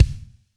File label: marimba drum; ゆめ2っき